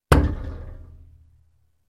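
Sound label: hit on a blunt object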